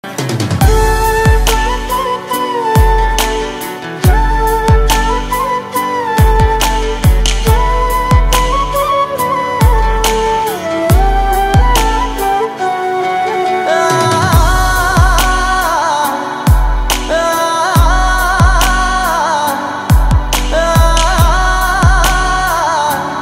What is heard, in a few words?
music ringtone